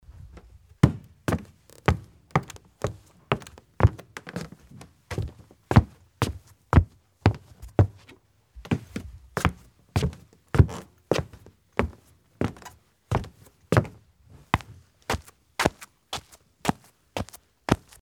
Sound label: wood corridor